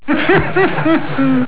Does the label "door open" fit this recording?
No